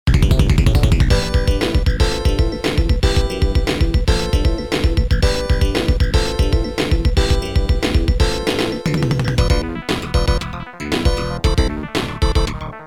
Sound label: Sega Genesis Remix; Rushing Beat; Rival Turf!